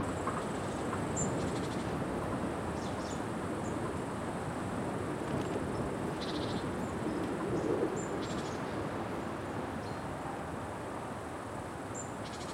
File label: fieldrec; ゆめ2っき